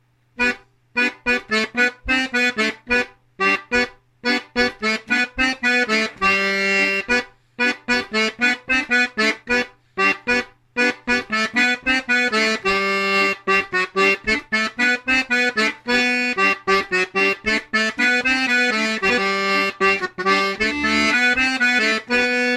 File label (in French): Sauta petaç en SOL